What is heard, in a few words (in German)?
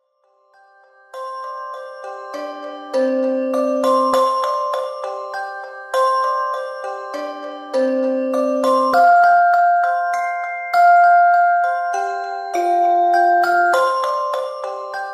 Entspannungsmusik für Babys